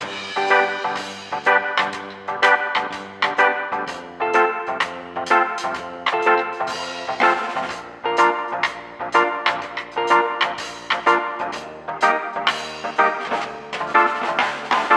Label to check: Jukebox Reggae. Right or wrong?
Right